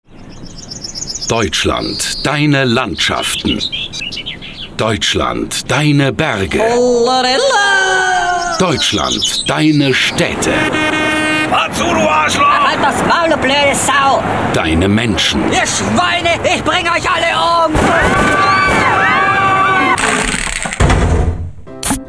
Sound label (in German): burps weder weizen